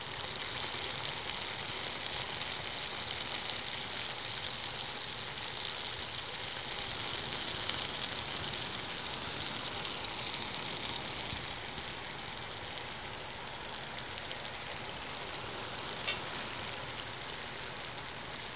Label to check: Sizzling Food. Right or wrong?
Right